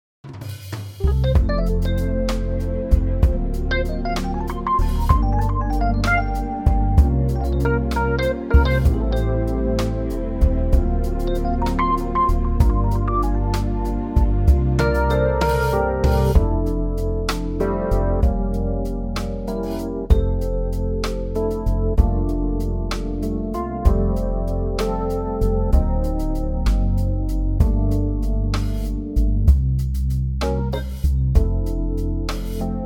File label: F 12 8 smooth; key - F - vocal range - C to E; OrangeTree Samples Electric Piano